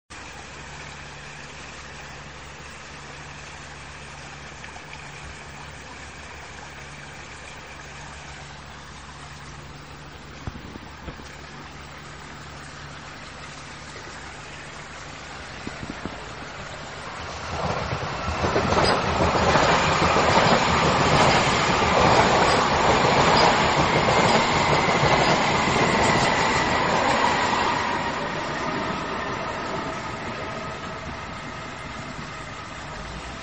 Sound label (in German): Bach S Bahn